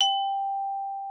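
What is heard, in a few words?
xylophone G4; Xsample VOL-4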